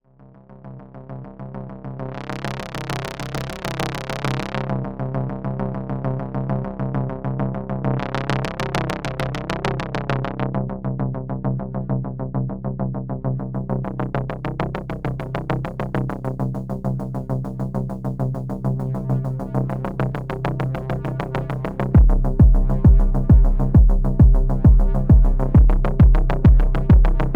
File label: Techno LFO